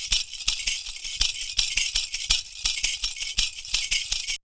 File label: PERCU; Univers Sons; Raricussions